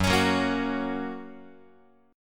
Gb Major Gb, Guitar; strummed; 4A,4E,5A,5D,5G#,6C#

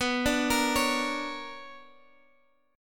B Minor Major 9th, Guitar, Open G

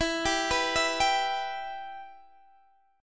E Minor E, Guitar, Open D; x,19,17,17,19,17

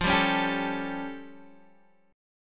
A Augmented 7th F

